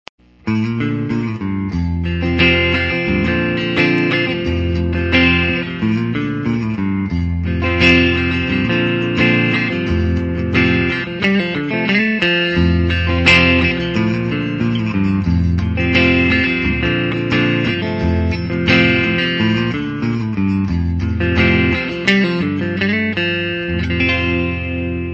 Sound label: Titan Clean w Verb